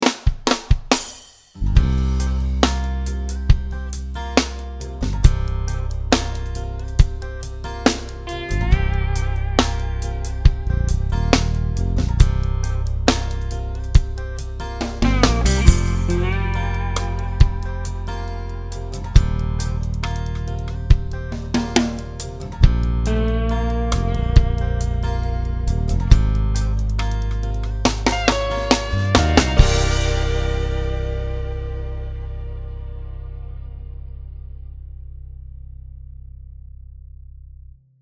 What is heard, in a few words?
Backbeat; Cops